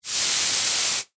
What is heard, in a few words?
Acid Burn Vampire, Horror, Acid, Burn, Vampire, Skin, Burned; Skin, Burned, By, Holy, Water, Sun; Monster Sound Effects